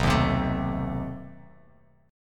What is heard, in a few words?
B 7th Flat 9th B down, Bass Standard